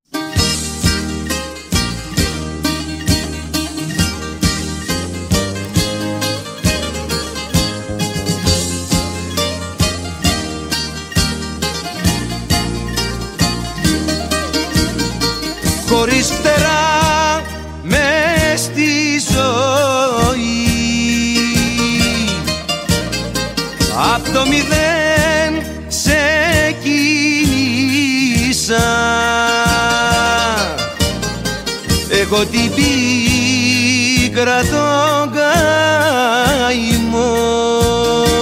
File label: Zeibekiko; Senior Open Solo; Rizoelia Banquet Hall